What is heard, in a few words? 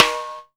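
jazz drum snare drum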